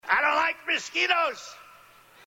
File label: mosquito